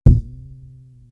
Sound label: Beatbox; AMG